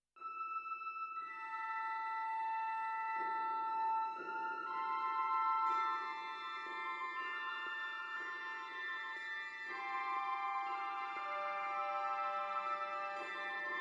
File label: A4 solo drone